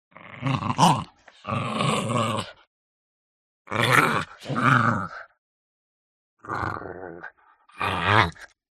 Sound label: DOG Chihuahua Snarl Fight; ANIMAL; smartsound